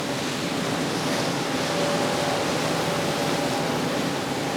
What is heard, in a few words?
ship move; Hearts of Iron IV Death or Dishonor